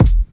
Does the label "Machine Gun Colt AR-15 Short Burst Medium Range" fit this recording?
No